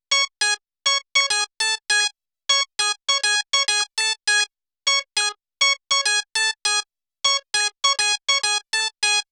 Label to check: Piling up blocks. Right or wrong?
Wrong